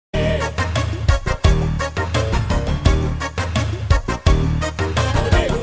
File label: Loop